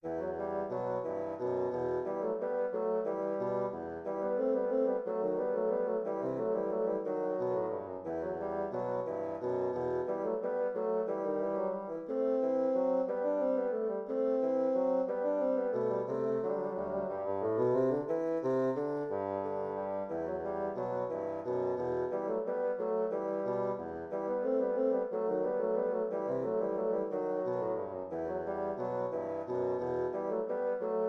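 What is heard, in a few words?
duet 2 bassoons; molter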